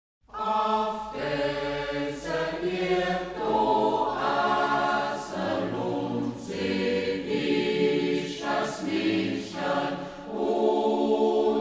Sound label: Reußmarkter Chor; Ortsmundart: Reußmarkt; Af deser Iërd; Hermann Kirchner (Melodie); Hegt wird gesangen